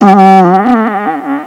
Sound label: farts